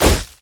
projectile impact; Crystal Maiden

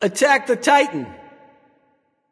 Grand Slam Attack; Hercules

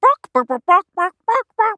Chicken; Mage; Kunoichi; Realm Royale

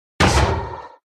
Iron Golem Hurt; minecraft

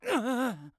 Death; Paladins; Lex

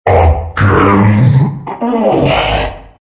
big death; Undying

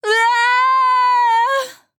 Falling; Princess; Paladins

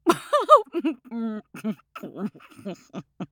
Hunter Laugh; Realm Royale

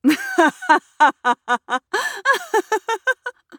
Laugh; Realm Royale; Mage; Kunoichi

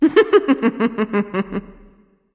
laugh; Artio; Smite

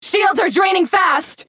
Taking Damage; Kinessa; Paladins

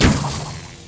projectile launch; Drow Ranger

